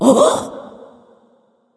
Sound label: surprised; smite